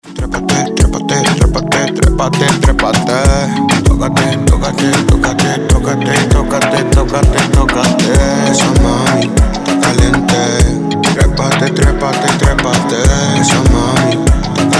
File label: REGGAETON